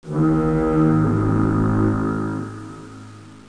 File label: foghorn; PUZMANIA